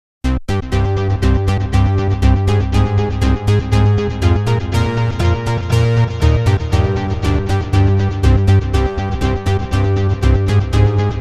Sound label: GTR